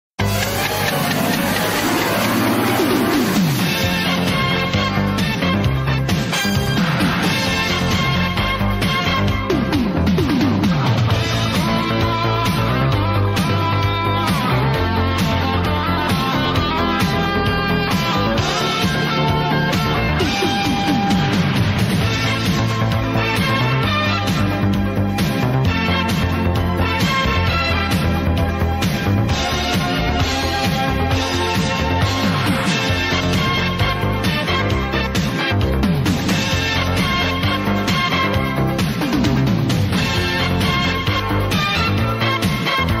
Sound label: theme tune